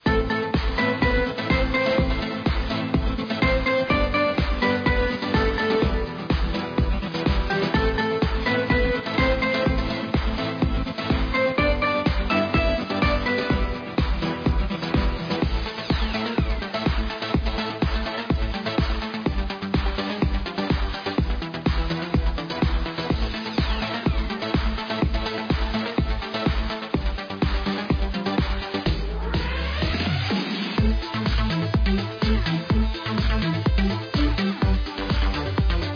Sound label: BGM; Brazil; football